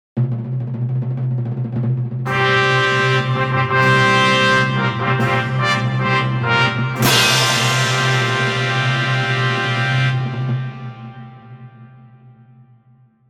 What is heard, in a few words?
fanfare; flanders